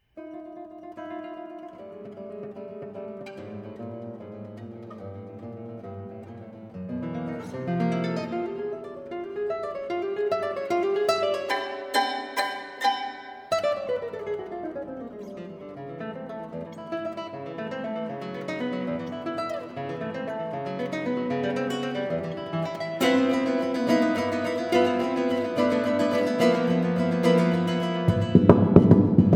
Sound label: guitar